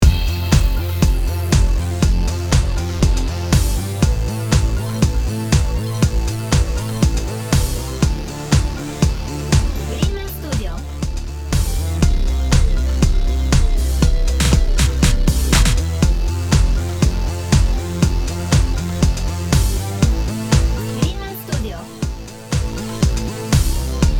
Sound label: Dance 80